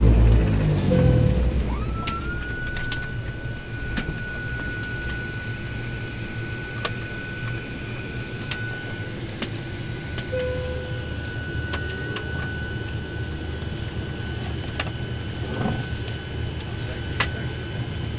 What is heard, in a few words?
airplane off people exit; best service Blue Box